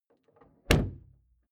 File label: car door close exterior hummer h3; Cars